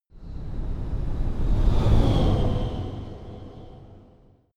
sci-fi vehicle train passing; raumschiff

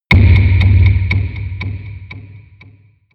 low drum; whooshes rises

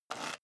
step high heels concrete grit slide short; schritte; Footsteps; menschen

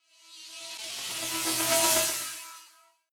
industrial riser short

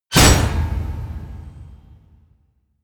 slam, tueren

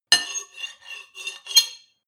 cutlery on plate cut squeak; berufe; soundpool

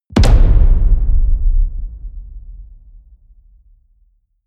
drum hit simple large

